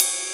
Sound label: Ride, Rides; Electronisounds